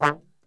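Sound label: D 2 trombone shot, Brass; Best Service; Brass Super Section; TRMB SHOT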